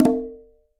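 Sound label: Conga A Flam Open; Latin Percussion; Drums; Drum Hits; Ableton; Factory Packs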